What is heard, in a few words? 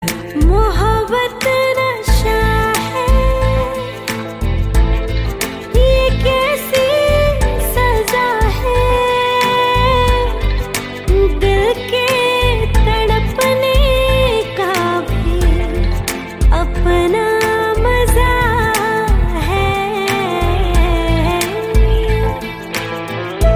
ringtone female version; hate story 4